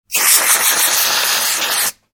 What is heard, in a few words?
food cream can aerosol spray, Aerosol Whipped Cream Can Spray; Shaking; Objects; McKinneySound